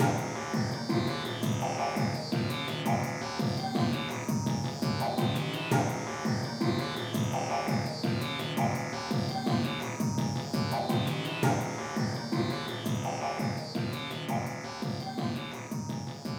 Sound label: BGM Mission Stage Leak; Alterna 04